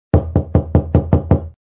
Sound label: door knocking